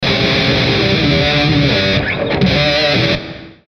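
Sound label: Musical Element, Drum Snare March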